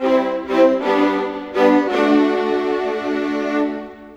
Viola, Violins, Orchestral, Rock Pop; Sonic Foundry (Sony Creative Software); Orchestral Series VOL-4; Rock and Pop; Rock-Pop 03

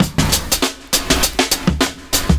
70s Breakbeats; USB Soundscan vol.46; AKAI

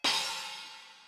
crash, Millenium MPS-400

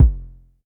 Polite kicks long F1; LIMAT Div Dept; Drums

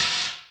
High Hat, Drum Machines E-MU Pro-Cussion

